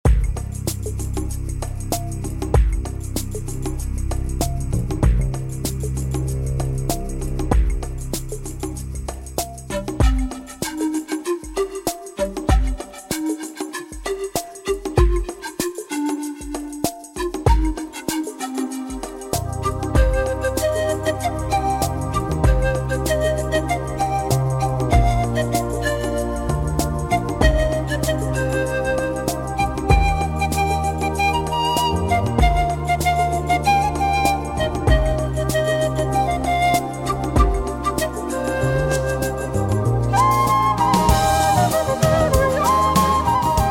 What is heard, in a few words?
Flute Solo